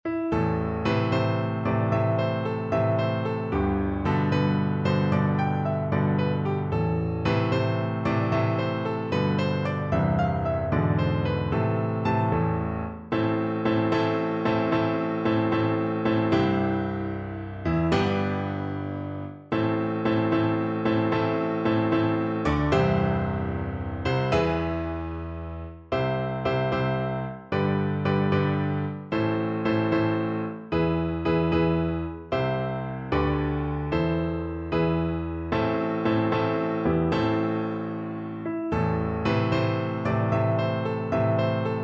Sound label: johnny marching home, Tuba